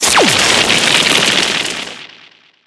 Fire; Skylanders Cloud Patrol; Arcade, iOS, Mobile; Skylander SFX

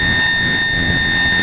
Computer screech; Five Nights at Freddy's 4

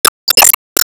Sigh

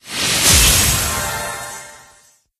Pack Flip Legendary

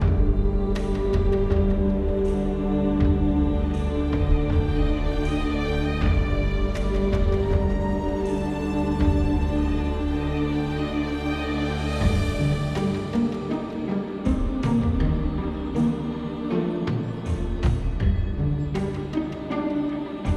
Dark Forest

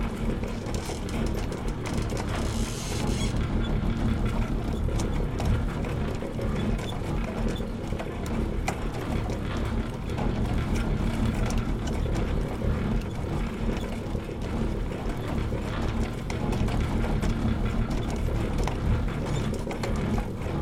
Cruiser Turbulence; Lethal Company

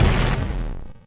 explosion

